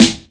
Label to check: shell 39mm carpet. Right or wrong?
Wrong